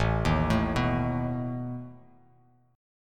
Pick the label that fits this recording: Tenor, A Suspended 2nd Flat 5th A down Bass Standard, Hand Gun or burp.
A Suspended 2nd Flat 5th A down Bass Standard